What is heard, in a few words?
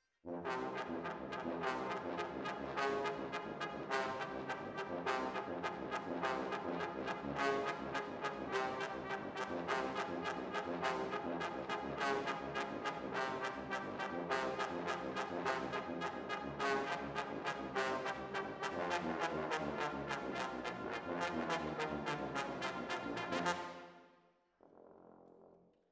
ORTF, Jazz for piano